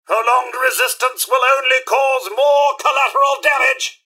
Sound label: attack; nukarobots; mrhandy